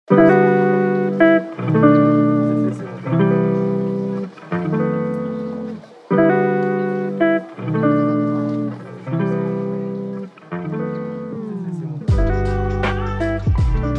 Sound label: test with music